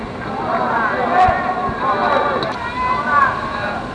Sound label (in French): chant